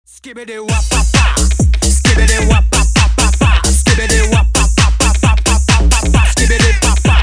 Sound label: win; MusicRoundEnd